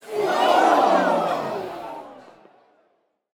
crowd ouh; CrossCode

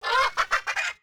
chicken; CrossCode; battle

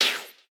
dash

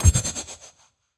ferro barrier break

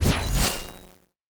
close combat shock sweep massive; CrossCode; battle

